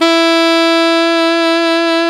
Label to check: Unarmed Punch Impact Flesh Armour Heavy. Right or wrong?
Wrong